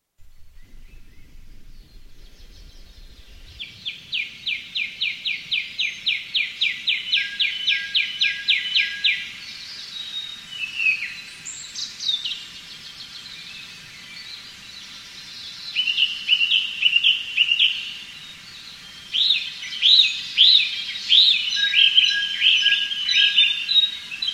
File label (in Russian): zvuki prirody vesna prishla; vesennii sbornik